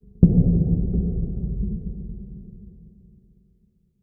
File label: metal hit far; soundscapes; occultists